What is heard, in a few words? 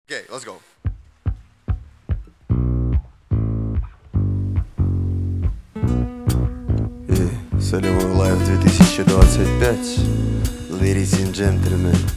acoustic live